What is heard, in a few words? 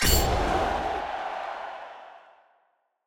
countdown